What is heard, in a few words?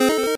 8bit se